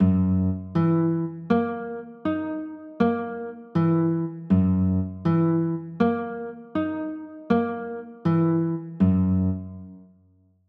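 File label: G flat 6 chord, guitar; g flat 13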